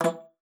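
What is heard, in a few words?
E3, bass flute; Xsample VOL-2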